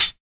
Closed Hats

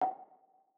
Tom

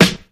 Snares

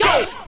Vox; Army Goo

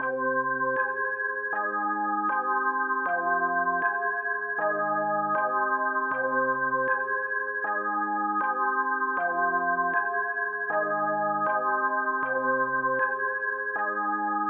157 c minor Omnisphere

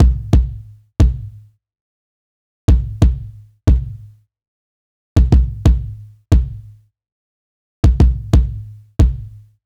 Jazz 90bpm Kick

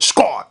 Squad Chant, Vox

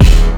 Synth Kick

